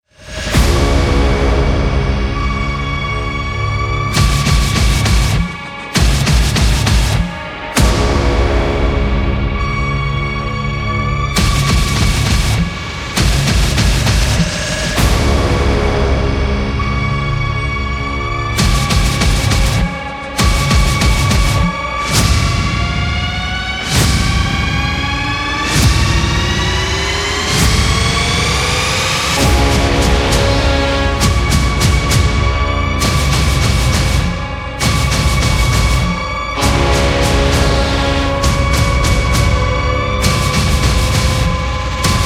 Horror Trailer; scary background music

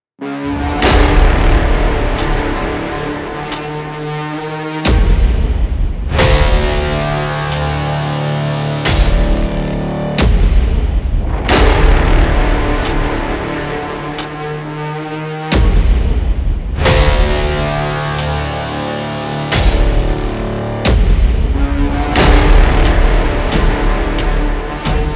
Virus, scary background music

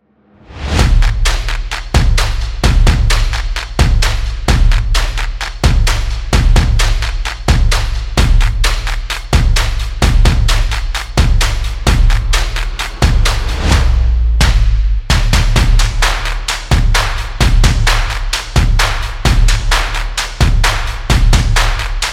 Opener Percussion Music; energetic

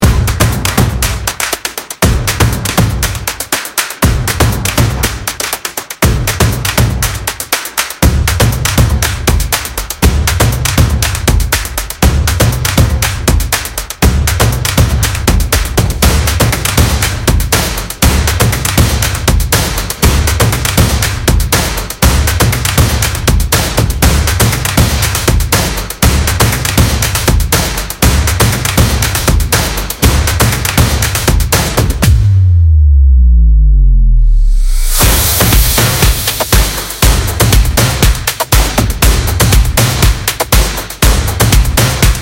Stomp Action Music; energetic; energetic percussion music

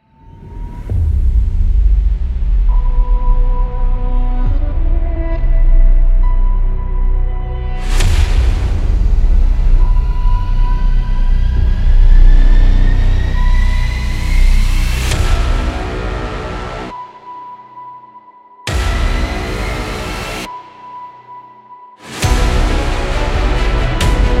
Heroic Epic Music; motivational epic music

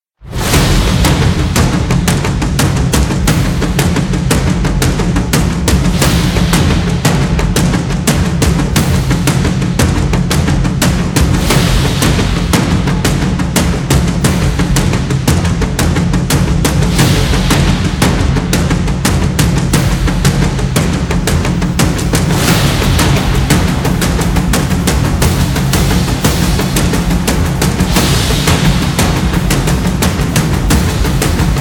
Battle War Drums; intense drum beats

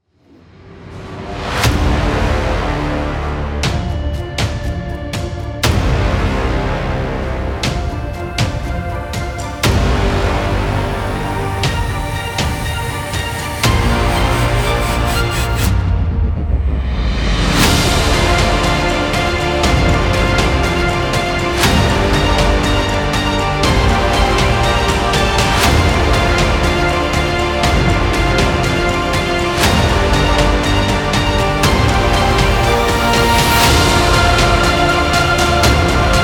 Inspiration Heroic Music; motivational epic music

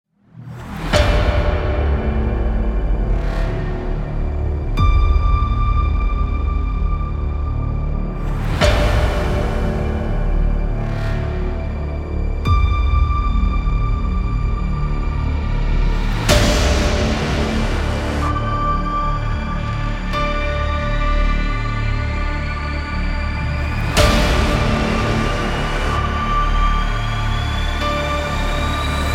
Cinematic Intro Opener; epic background music

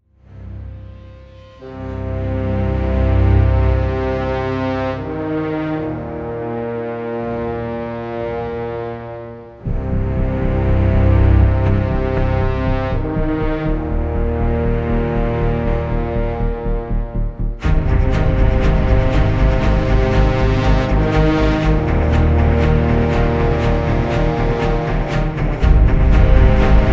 Cinematic Trailer, motivational epic music

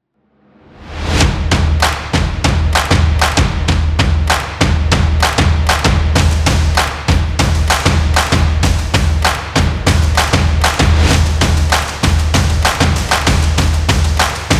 Action Percussion; energetic

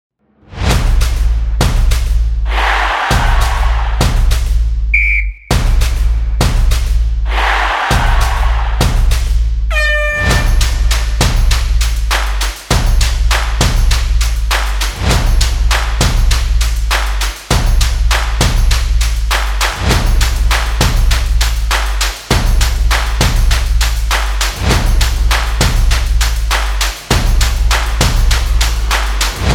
Stomp Percussion Music; energetic

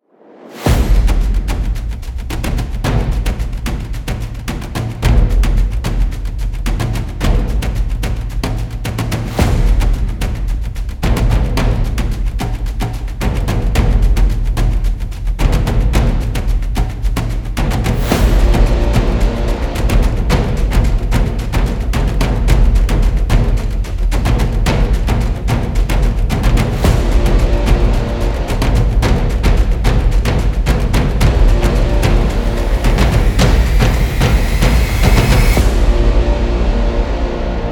Drum Opener Trailer, intense drum beats

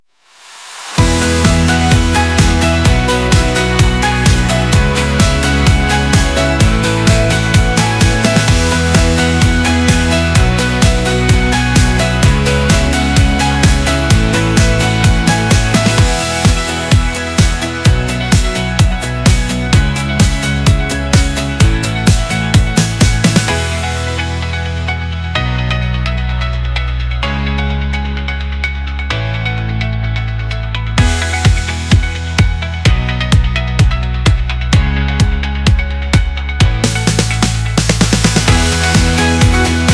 Inspiration Corporate Background; happy upbeat music

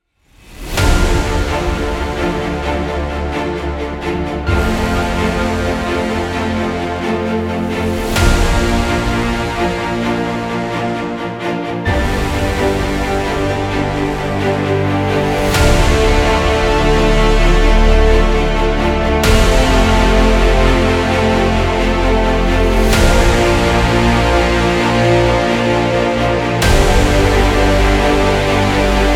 Epic Intro Opener; epic movie trailer music

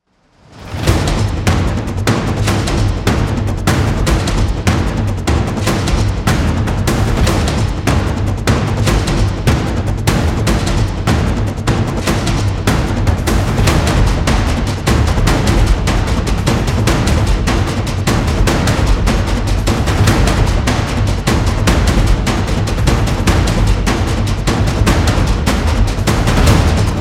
Cinematic Drums; intense drum beats

